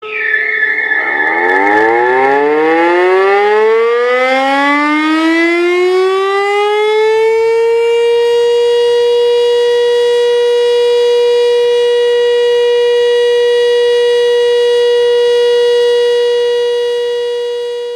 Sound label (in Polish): Syrena OSP